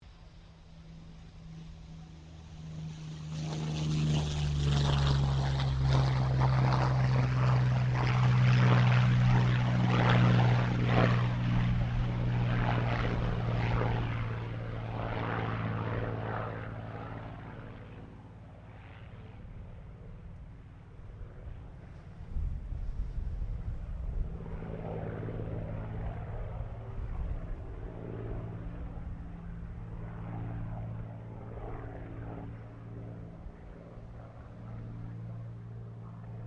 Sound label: AIRCRAFT YAKOLEV YAK-9 TAKEOFF, Russian Yak-9 fighter plane; Airplane Sound Effects; Airplanes (Sound Effects:Vehicles)